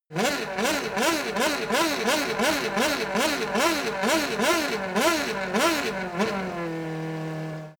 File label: racing car f1 rev medium close